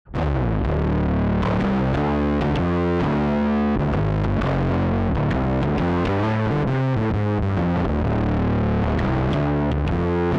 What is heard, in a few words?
Stoner; Catalinbread